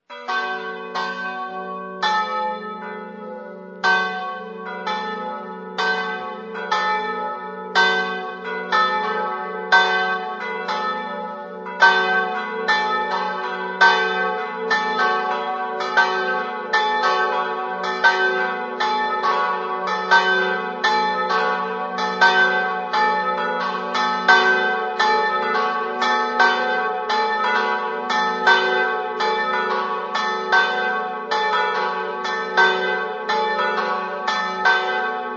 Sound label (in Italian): cartions, campane